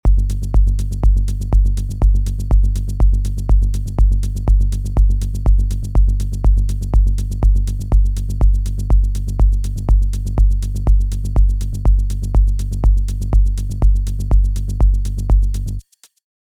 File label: Techno Kick Sidechaining